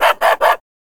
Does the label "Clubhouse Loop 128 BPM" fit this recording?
No